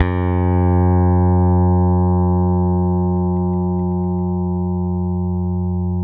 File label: G 3, compresence, bass; Spectrasonics; Bass Legends